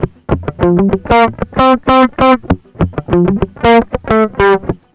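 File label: RYTHM04, rythm guitar; USB Soundscan vol.31; Miro s Grooves; AKAI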